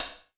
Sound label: HHC VRB, Yamaha RY-10, Drums